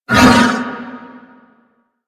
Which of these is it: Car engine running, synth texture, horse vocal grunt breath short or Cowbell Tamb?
horse vocal grunt breath short